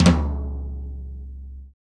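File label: tom low tom, acoustic toms; Roland - Rhythm Section; Real Toms 1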